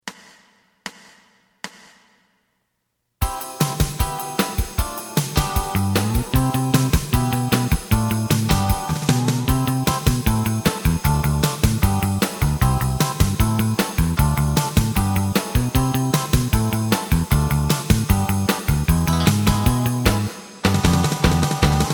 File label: lead